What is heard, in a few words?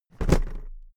briefcase plastic drop heavy; industrie wirtschaft; bueroarbeit